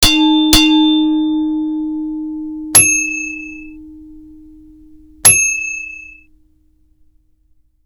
Boxing Bell

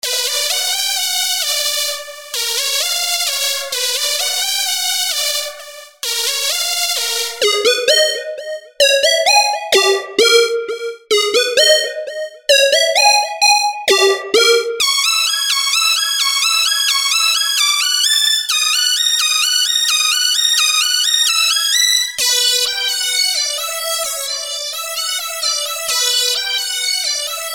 TRAP LEADZ

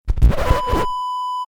record scratch

